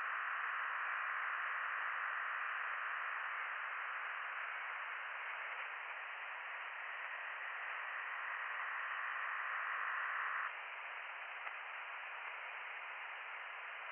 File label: from radio, 7177KHz